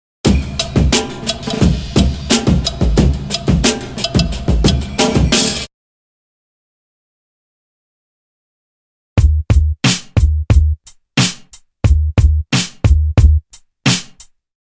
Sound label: Drum loop; BEST OF DRUM BREAKS 30